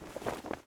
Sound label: item hat pickup